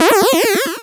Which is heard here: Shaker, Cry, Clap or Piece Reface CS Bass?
Cry